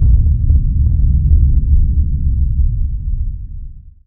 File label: onslaught motion; weapons; dota; primal beast